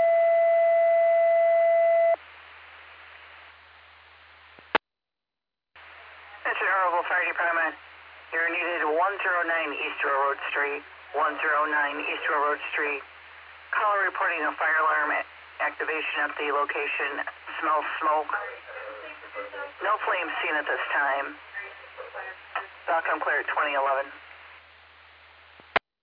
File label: Fire; Earlville; TTDAlerts